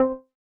Transitions Great Lead C3

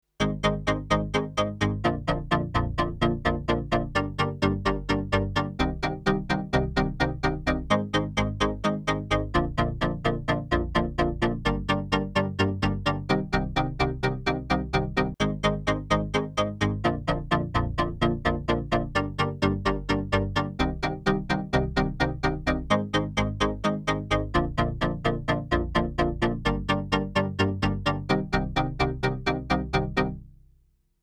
Leads Sharp Plucks 128bpm Cm

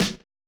Snare

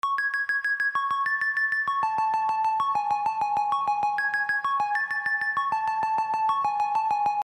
BELL Katana Bell

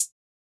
Triad

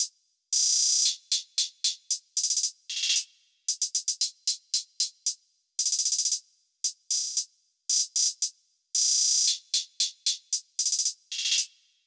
114 HiHat

